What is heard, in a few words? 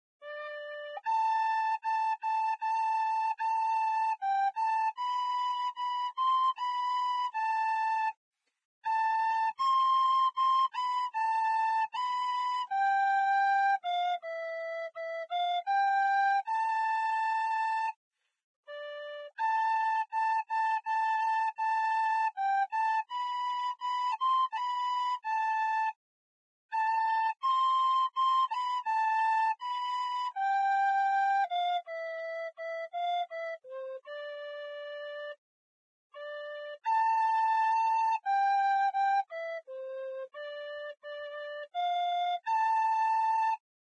Flute; Zomboid; Lifestyle